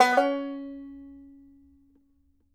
DB4, BANJO NOTES; Sampleheads; Whole Lotta Country 1 & 2

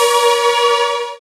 SYN DANCE; Sound & Vision - Gigapack II

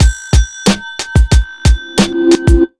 91 Beat, Underground Hip Hop; USB Soundscan vol.02; AKAI

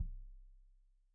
Kik Stereo; Deeply Sampled Drums

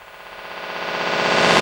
Time Rev; Zero-G Jungle Warfare 1, 2 & 3; 90 s Sample CDs

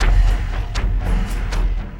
Elektro Thunk Cym, Drums; Sonic Foundry (Sony Creative Software); Friendly Fire; Marc Steve

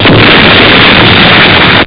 explod; ST-XX Amiga Soundtracker Sample Packs

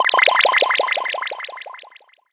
Sci-Fi Lasers - FX Sweeps; Antidote Advent

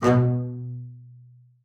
B2, bass spicc; Xsample VOL-5

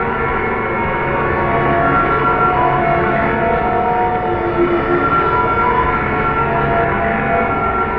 Drone Ambient; Drums & Drones

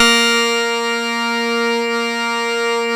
A#, PIANO; Big Fish Audio; Synclav FM

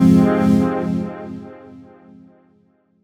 Fm, Chords; Electronisounds; LostTomb

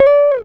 GTR TRIK, Jazzistic; Univers Sons

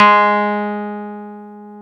DX EP; INIS; Vintage

